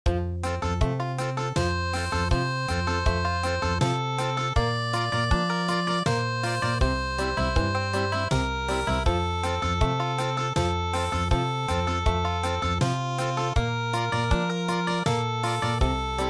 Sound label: British Country; traditional